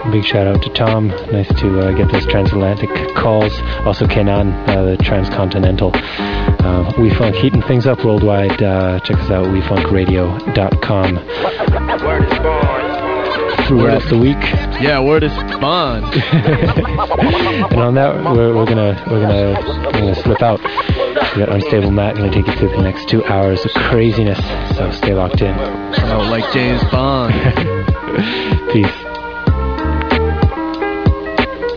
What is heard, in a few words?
shout